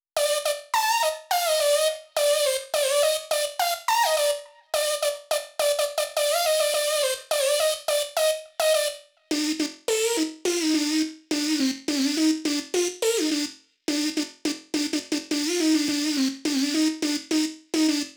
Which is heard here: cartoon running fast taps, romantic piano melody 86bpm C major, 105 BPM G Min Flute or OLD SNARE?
105 BPM G Min Flute